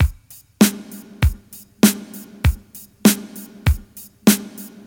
99 bpm drum beat D key, Free drum beat - kick tuned to the D note. Loudest frequency: 2702Hz